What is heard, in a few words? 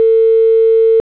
ringback